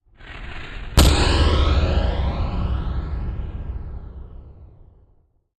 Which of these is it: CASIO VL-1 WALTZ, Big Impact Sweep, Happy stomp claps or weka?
Big Impact Sweep